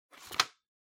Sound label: Time Card Rack Put In